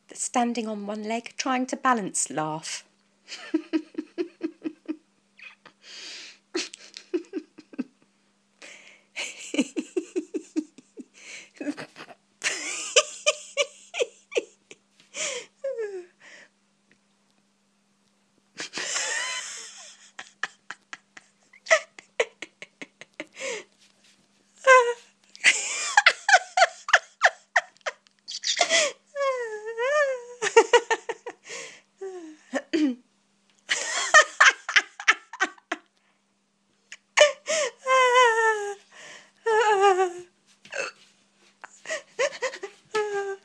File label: laugh